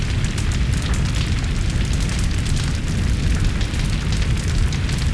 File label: FIRE AMB